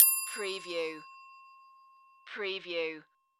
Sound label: Desk Bell Ring Store